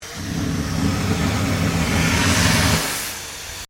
Steamtrain Venting; Bellarine Tourist railway vehicles; Trains

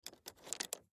Lighter Pick Up Rocks; Lighter Pick Up Rocks Slow; Foley Lighter Pick Up Rocks Slow; Lighters (Sound Effects:Bars); Blastwave

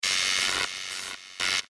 Sci Fi Buzz Electrical Dirty; Tech; Blastwave

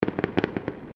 Fireworks Multiple Crackles; Fireworks (Sound Effects:Backgrounds)

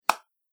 Single Hand Clap; Body Sound Effects; Human Body

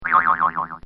Comical Sound Series Boing Spring; Funny Sounds

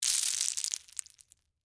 Debris Crackers Fast, Oyster; Texture; BlastWave

